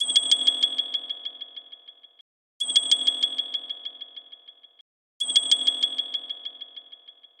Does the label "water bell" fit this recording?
Yes